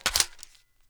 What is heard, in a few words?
mac11 mag in; weapons; garrysmod